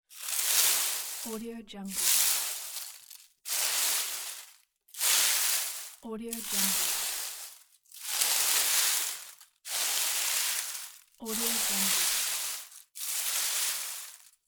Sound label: leaves slow raking